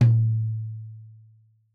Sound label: Tom2 Stereo, Deeply Sampled Drums; SMDrum